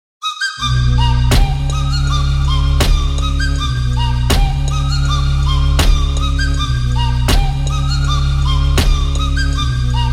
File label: Electronica